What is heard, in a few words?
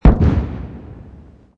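explosion medium; modern warfare 2; amb elements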